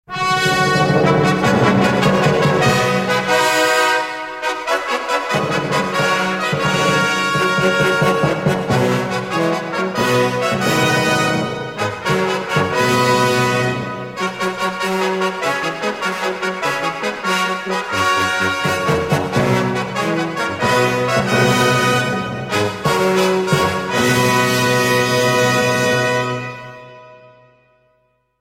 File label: fanfare long